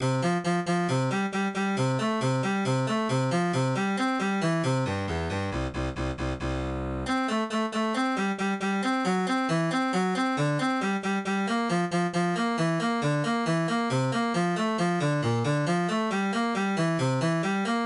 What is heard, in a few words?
MIDI; BWV10